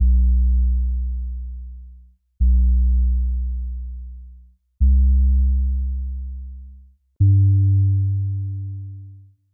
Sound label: Bass Idea; Sample Growing Stream 002